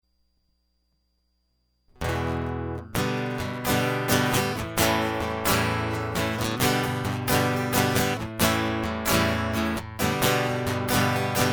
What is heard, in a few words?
Guitar Loop